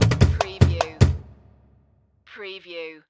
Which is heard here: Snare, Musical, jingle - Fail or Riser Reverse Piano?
Musical